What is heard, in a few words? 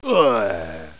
die; Caesar III